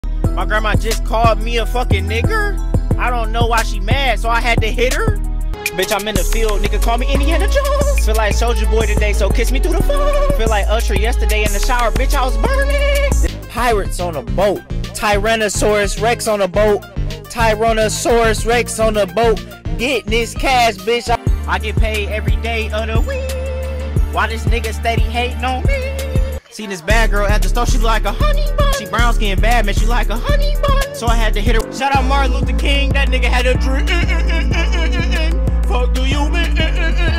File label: rap hiphop